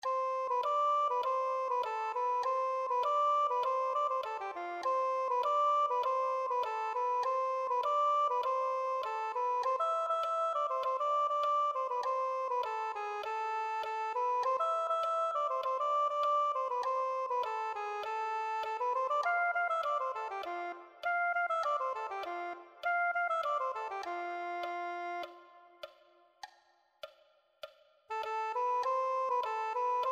soprano saxophone

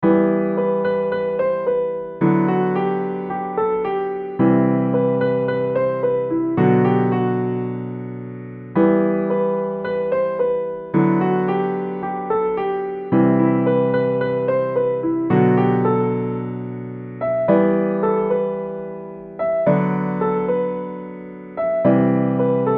solo, piano solo

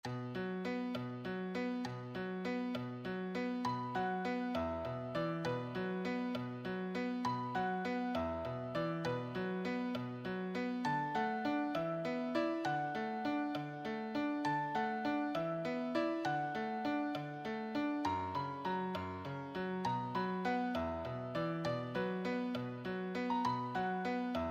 solo, piano

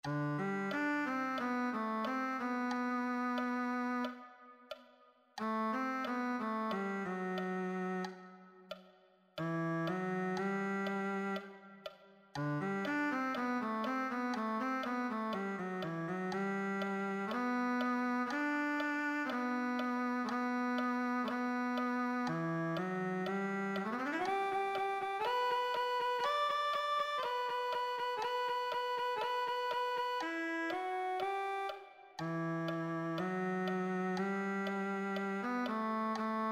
cello

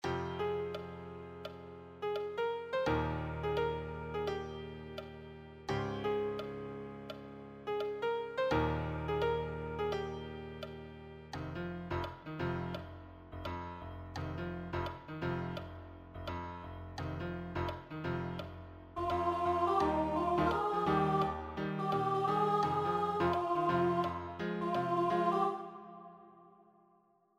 accompaniment, piano

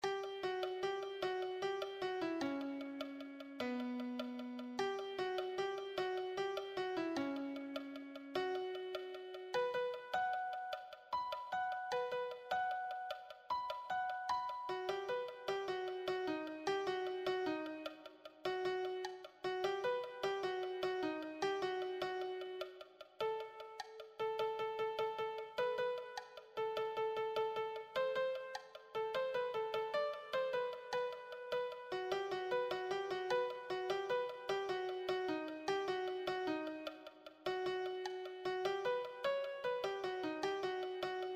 melody

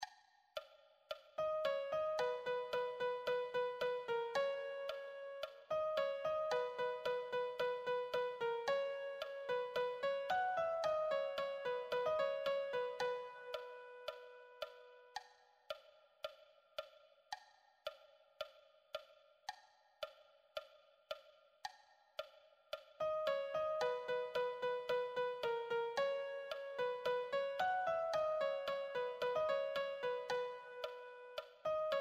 melody

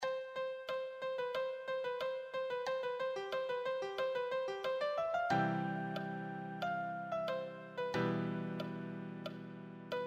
easy solo, piano

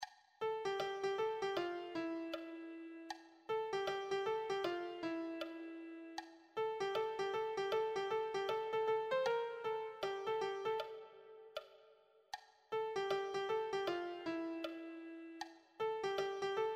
melody, piano

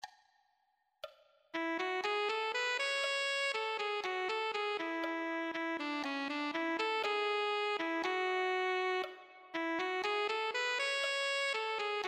alto saxophone

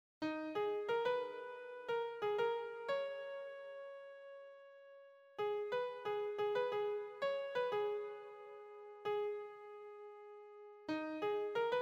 melody, piano

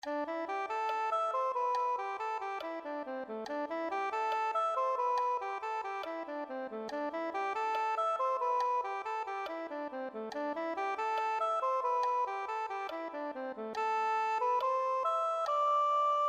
soprano saxophone

